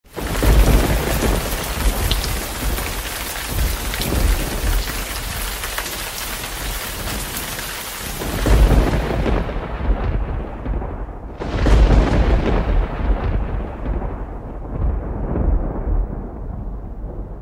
Sonidos truenos rayos relámpagos; efectos; sounds

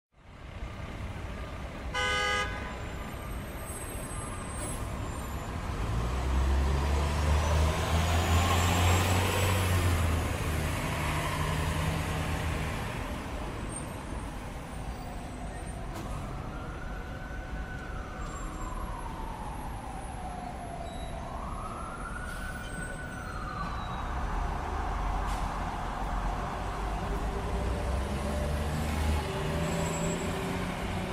Ambiente De Ciudad Ruido De Transito, City Atmosph; Efecto de sonido

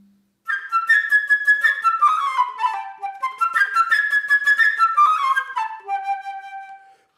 Pájaro Flauta

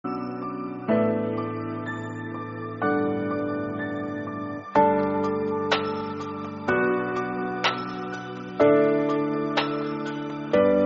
Relajante Música Instrumental de Fondo; también para estudiar y concentrarse

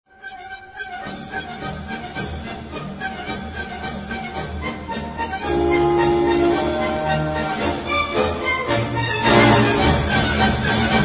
orquesta clasica

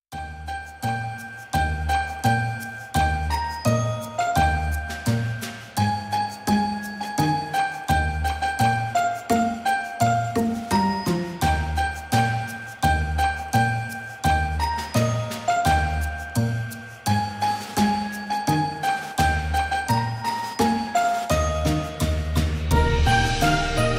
Jingle Bells Villancico de Navidad Instrumental

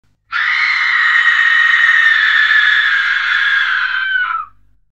Grito Chica Terror

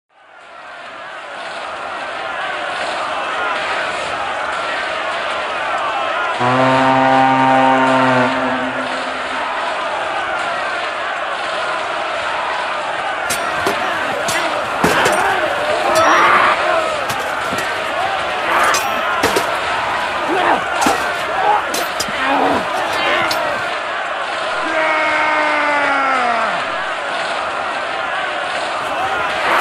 Batalla medieval Sonido ambiental

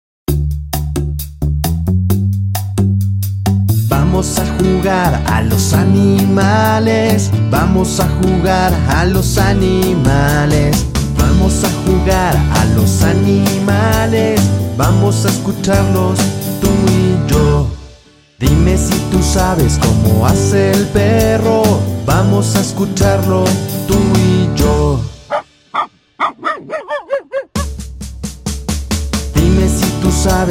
El Sonido de los Animales Canción Infantil

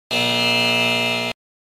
Efecto de sonido Error

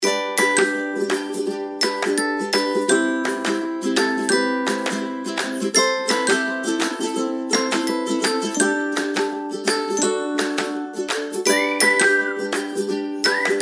musica de fondo alegre y divertida musica instrumental alegre; para videos; para publicida

